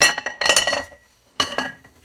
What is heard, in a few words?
ceramic china plates clanking together; foley